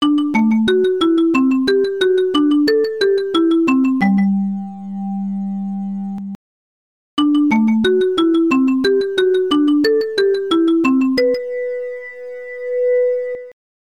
Stereo Test; arcade

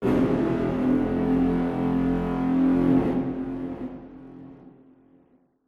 Music stinger; Hunted: The Demon's Forge; x360

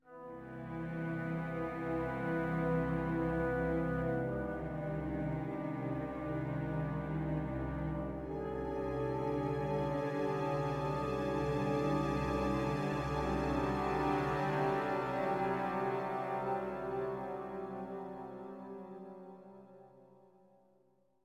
Riser; N.O.V.A. 3 Near Orbit Vanguard Alliance

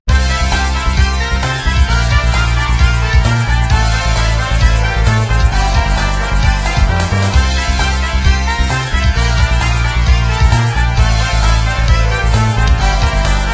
Dungeon; Adventure Bar Story; Android game music

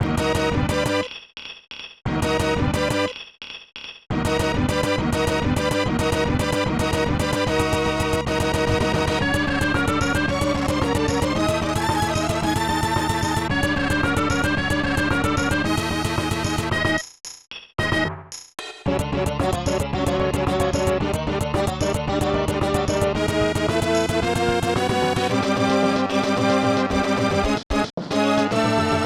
Boss Fight; prince of persia snes

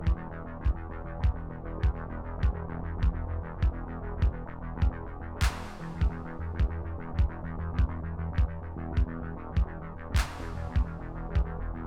Wave attack; Plants vs. Zombies Battle for Neighborville

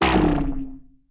Gloomy

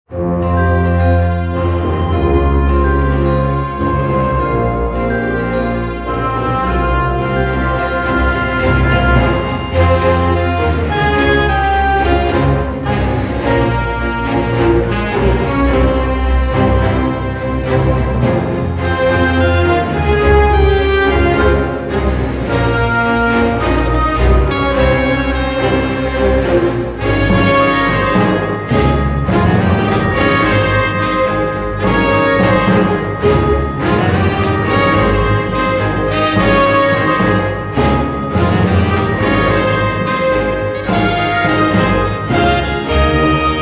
Orchestral Arrangement; Fight'N Rage Original Soundtrack Gold Edition 2023

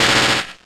Zing Zap

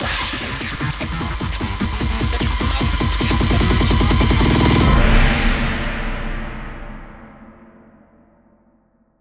Music Stings

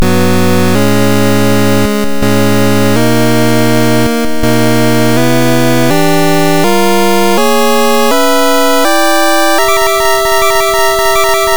Ominous Message; legend of zelda oracle of seasons; at Northern Peak & The Black Tower

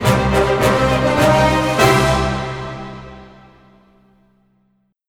Fanfare; Ratchet & Clank

